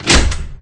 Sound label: door